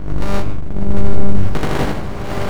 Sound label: BUZZY, Disjointed Textures; USB Soundscan vol.33; AKAI